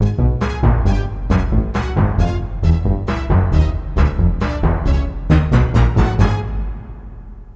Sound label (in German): Orchester; Ultima